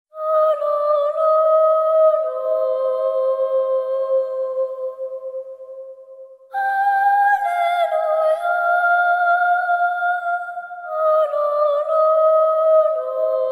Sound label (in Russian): zavorajyvaushee strashnoe penie